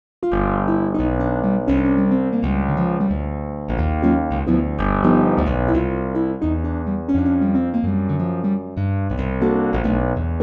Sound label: Clavi MKII; morphing; pianoteq8